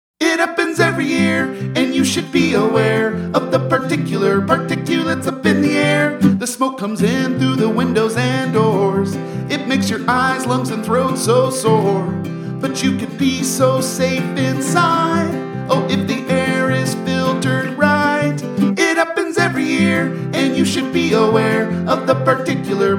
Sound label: wildfire smoke jingle